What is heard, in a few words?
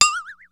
JD-800 KIT